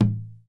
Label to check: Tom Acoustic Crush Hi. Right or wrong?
Right